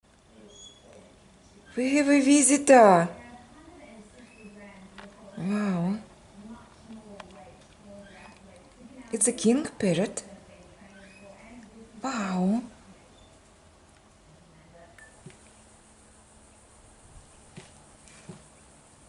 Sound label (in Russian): king parrot priletel korolevskii popugai; alisterus scarularis